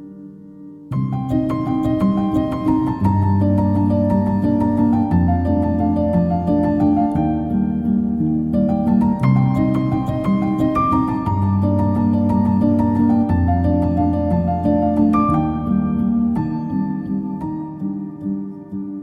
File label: idea ringtone; idea 10